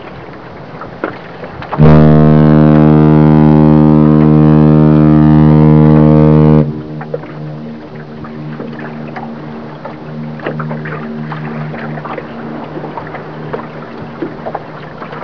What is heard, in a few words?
ship